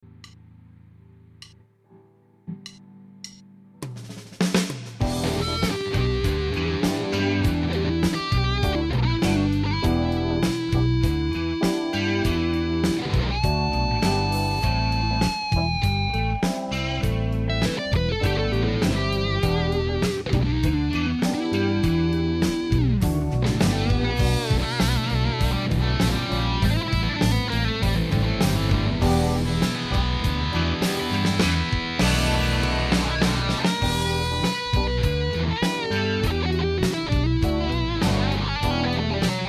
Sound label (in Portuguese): teste novo timbre